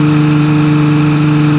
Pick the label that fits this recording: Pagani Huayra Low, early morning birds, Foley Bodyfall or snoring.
Pagani Huayra Low